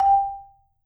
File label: MMED F G4, Marimba; MRMBA SPLIT